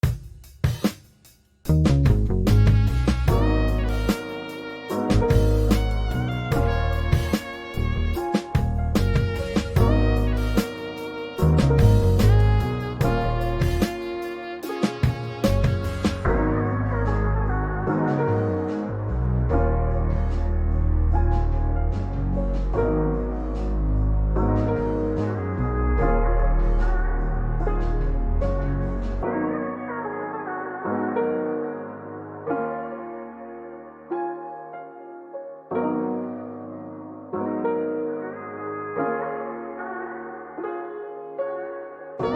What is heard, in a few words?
Jazz; Find the Chomiks; Jazz Rush